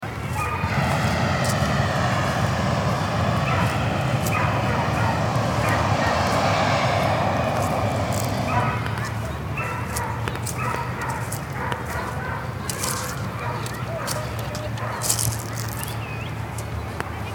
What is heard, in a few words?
park children dogs air balloon gas